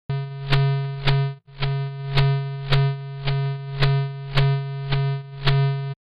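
summed output of all oscillators (sine tone) synchronizing with a period 30 pulse (noise burst; one strong and two weak pulses)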